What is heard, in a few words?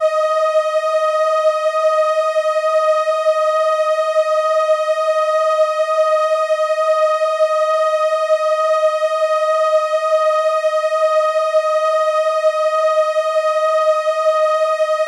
violin D#5, ARP Solina